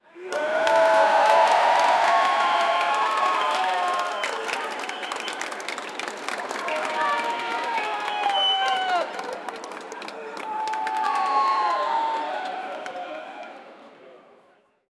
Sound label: crowd clapping at closed; Crowd Clapping at Closed Stadium; SFX Engine